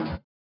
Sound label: SWUSH; Spectrasonics; Metamorphosis